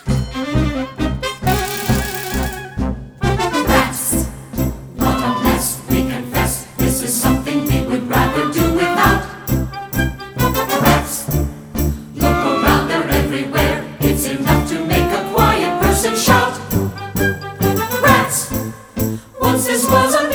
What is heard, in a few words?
vocals